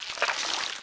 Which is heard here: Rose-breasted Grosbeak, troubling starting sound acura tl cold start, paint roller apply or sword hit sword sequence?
paint roller apply